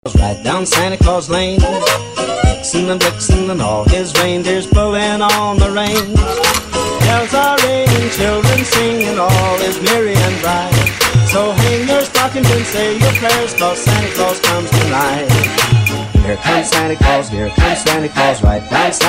Classic Christmas Remix

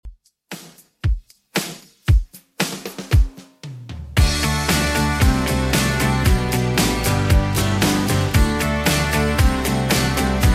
80s Redrum 115bpm

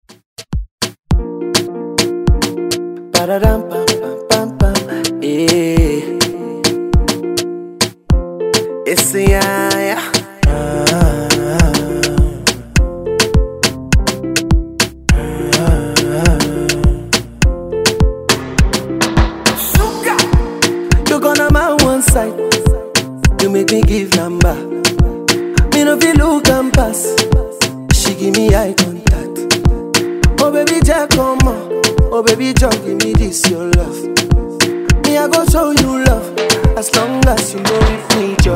Moombah Afro Redrum

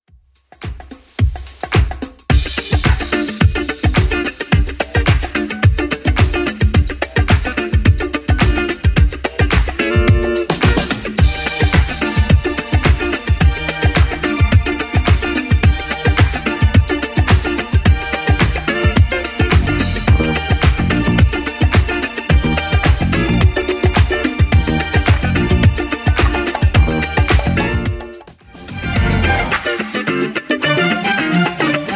80s Funk 108 bpm CLEAN; 80s Funk Edit

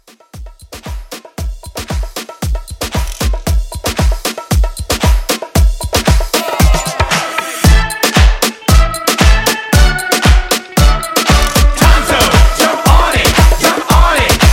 90s Moombah Re Drum 115

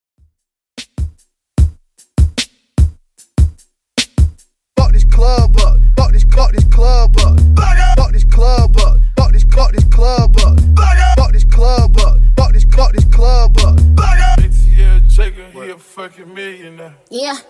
Top40, 75bpm

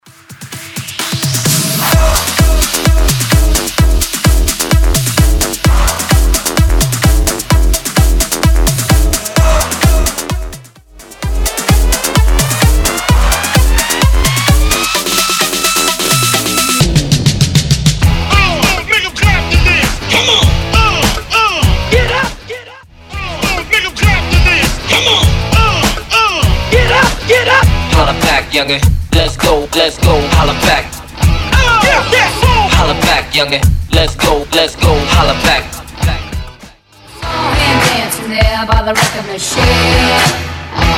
Bootleg Smashup 128bpm

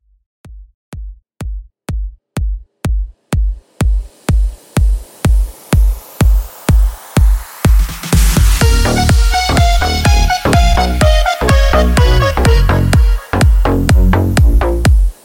Bootleg Rework 125 Bpm

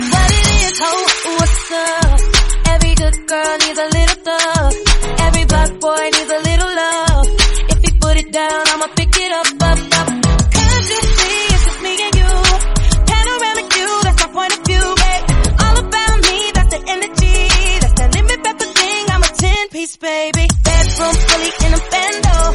95bpm E; remixplanet

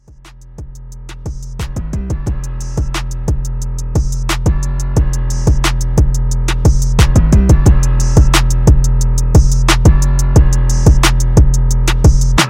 Edit 89

